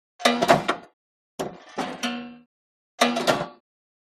Motorcycle; Kick Stand; Norton Motorbike Lifted Onto Stand.